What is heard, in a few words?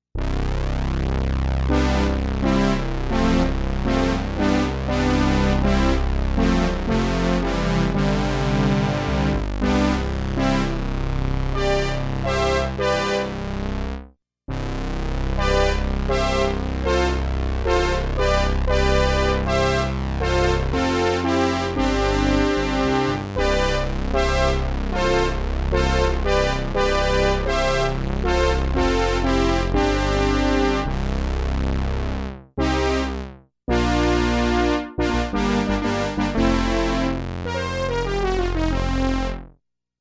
Brass Ensemble